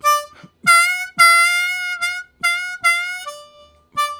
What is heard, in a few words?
One Swoop, Harmonica; Zero G